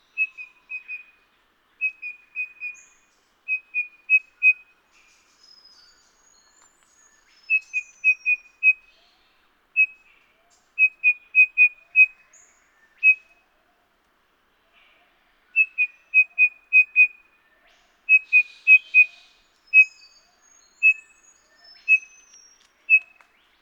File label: Heteromyias Cinereifrons; Grey-Headed Robin; Call